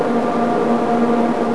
Blower EF641000 type

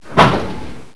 Grate

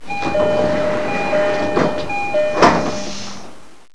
Door JRE233 Open

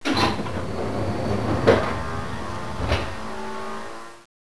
Door seibu4000 Open

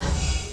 Brake

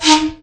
horn metro 10000

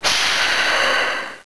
Brake

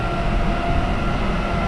low 8bit

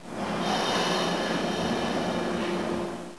Brake

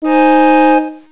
Taiforn synthe

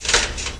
Breaker

